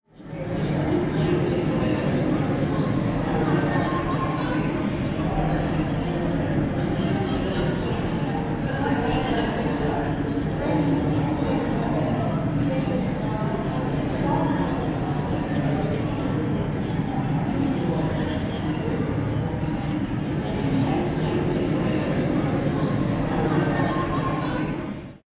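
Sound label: london Walla; Google Earth VR; Cities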